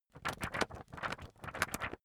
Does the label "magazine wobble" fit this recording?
Yes